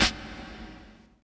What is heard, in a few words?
phreaky snare; Trance; Drums; Snares; Trance Explosion Vol1